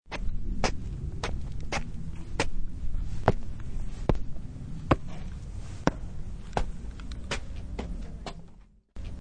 Medium paced walking up concrete steps with sneakers.; Footsteps on Concrete Steps 1